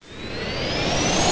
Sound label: VTOL off